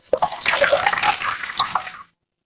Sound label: water swirl